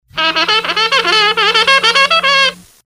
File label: Trumpet; WQAM